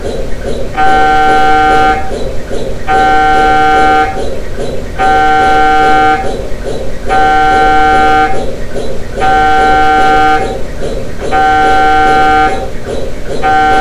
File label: Ambient Cryogenic MPRAGE